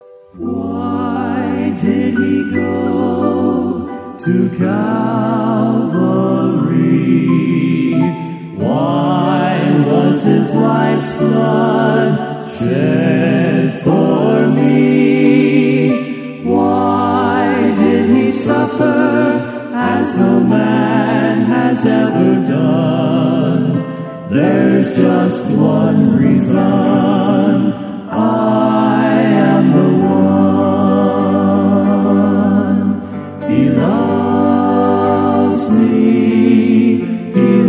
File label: Quartet